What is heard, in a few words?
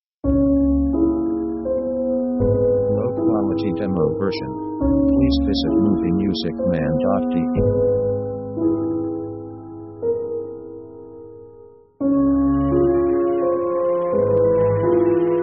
melancholic; orchestra themes